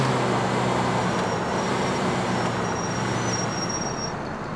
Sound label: throttle off slow, vehicles; landstalker